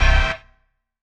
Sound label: HOUSE, House Dance